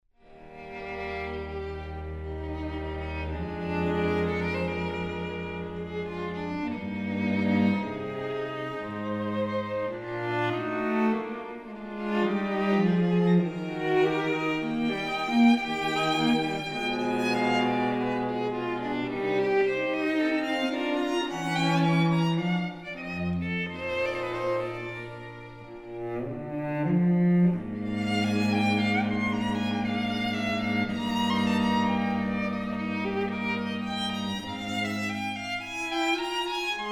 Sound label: trio